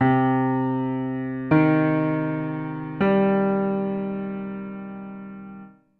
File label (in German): moll arp